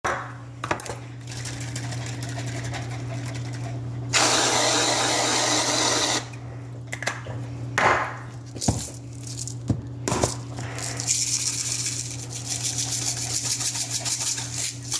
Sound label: cream; خامه فرم گرفته